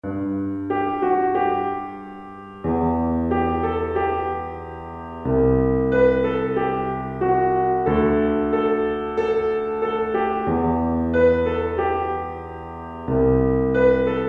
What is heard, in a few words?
pop piano